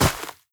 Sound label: step run, footsteps player grass